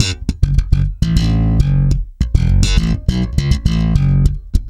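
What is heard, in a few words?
F#, hard funk, bass; Spectrasonics; Bass Legends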